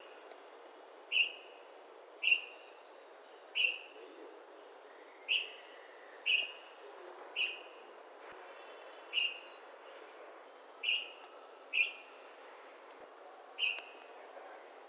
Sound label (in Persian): sedaye Bolbol